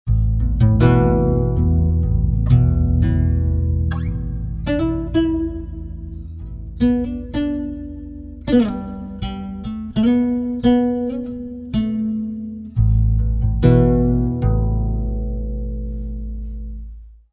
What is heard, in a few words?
guitar, pitchshift; bias peak